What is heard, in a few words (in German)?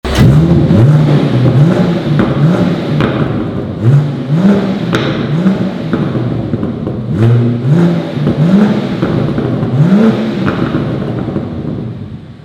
Sound Klappen Offen Stage3 M760li; BMW M760li G12 mit 880 PS / 1200 Nm